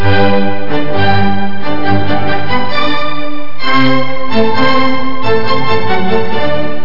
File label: orchestra